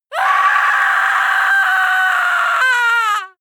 female scream horror; schreie; menschen

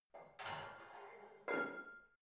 barbell plate slide in hit ring reverby soft; sport freizeit; fitnessstudio

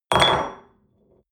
espresso upset table hard, restaurant cafe

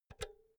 power cord pull out quick soft; objekte; elektronik

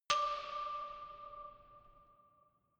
notification glockenspiel creepy single low

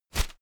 whoosh shredded paper quick

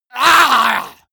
monster growl attack throaty; schreie

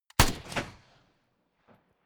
rifle ak-47 exterior fire single; comedy-cartoon; Pistols and guns; pistolen-gewehre

